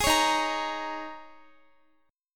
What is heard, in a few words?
Eb 7th Suspended 4th Eb, Guitar